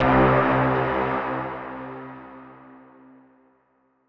chime; chime sharp

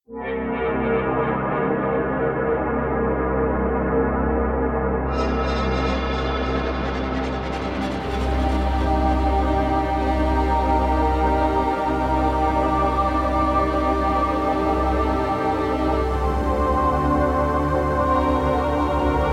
cinematic dark; Drifting Lands

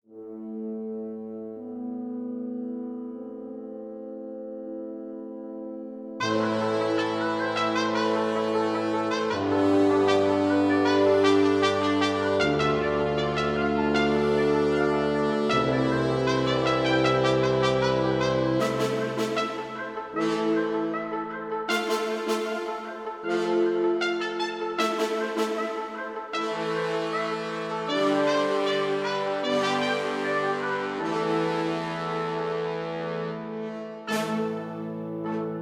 Music Speed Up Brass; 7 Mages

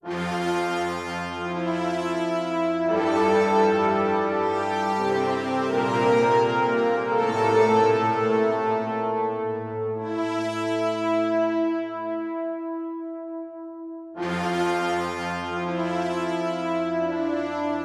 Loop Nofade Nobase; King Lucas

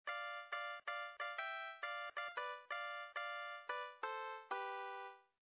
Melody

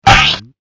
Piggy Tap; Clicker game

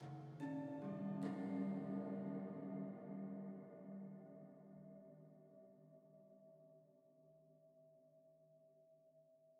Env Field Night Cave

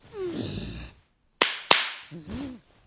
Clap lights off

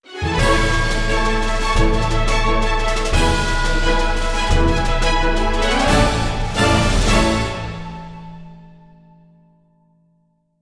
Victory! (Smash Mix); Yandere Simulator

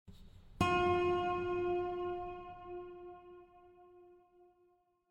Chime; chime sharp

